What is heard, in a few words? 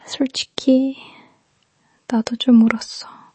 crying